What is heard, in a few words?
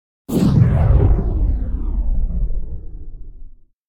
Weapon Sci-Fi Firing; Hollywood Edge; sound designer's toolkit v2